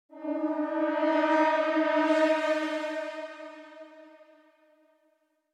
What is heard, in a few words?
Suspense Transition, Horror Sci-Fi Music